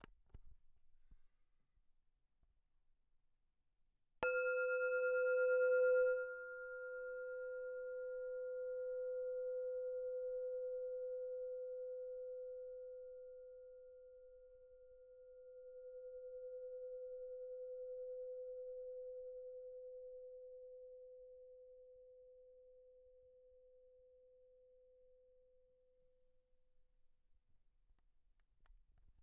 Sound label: Buddhist Prayer Bell